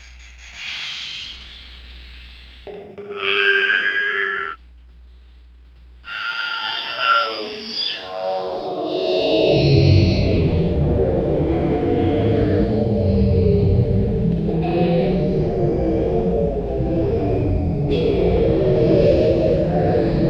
sounds of a space acid, alien

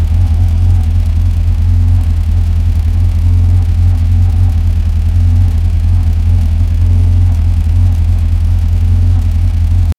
a clean realistic loop of, race car

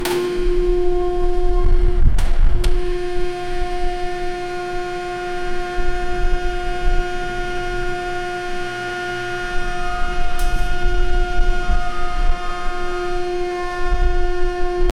industrial jaw crusher; machinery

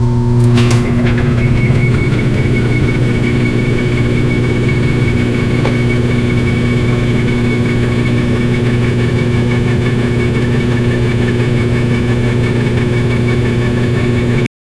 washing machine; home appliances

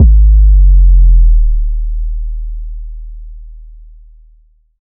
deep kick bass

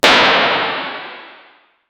arcade game crash effect

